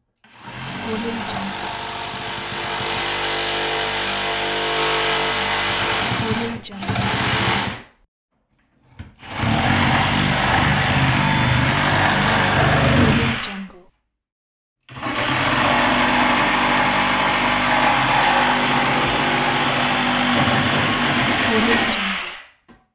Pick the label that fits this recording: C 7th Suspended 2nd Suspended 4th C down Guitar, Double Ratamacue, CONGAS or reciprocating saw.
reciprocating saw